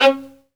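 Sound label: SOLO VIOLIN, Synclavier Strings; ILIO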